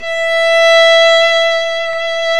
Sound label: VIOL E4; Roland - LA Composer vol. 1